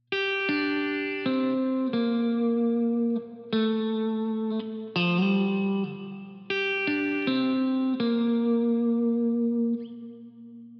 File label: Guitar Fills